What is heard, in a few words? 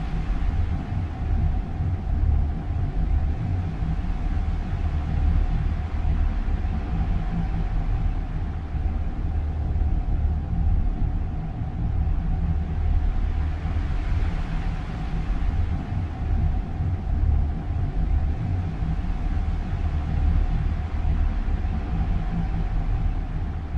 ship colony ship idle; Stellaris; machineage; ships